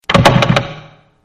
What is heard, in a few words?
Door Jammed